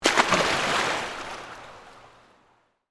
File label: Pool Indoor Splash, Water; Pools